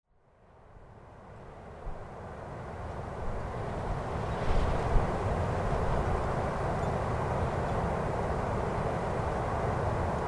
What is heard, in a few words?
Rhossili Down Soundscape